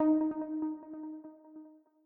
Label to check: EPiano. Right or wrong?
Right